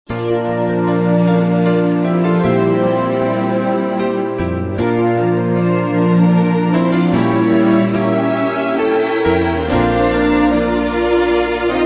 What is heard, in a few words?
Instrumental Hymns